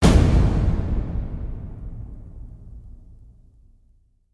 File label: Hit Big; Brand X Music; Brand X Music Catalogue - Editor's Essentials